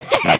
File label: soccer; emotes